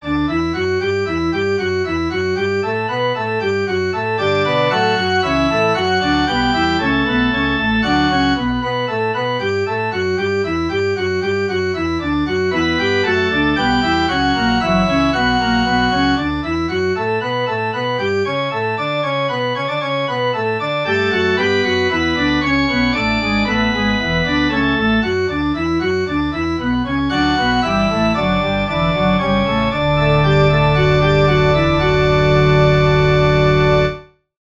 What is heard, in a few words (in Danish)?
forspil; min dd er mig til gode